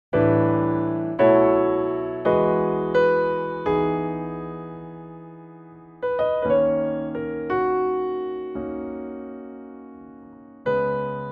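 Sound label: B to C piano, male; key - B to C - vocal range - E to E; piano only arrangement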